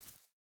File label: footstep hide grass jump start; Rust